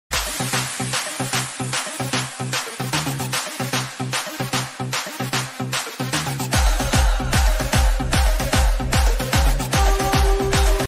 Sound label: song bgm ringtone tamil movie bgm ringtone; raghava lawrence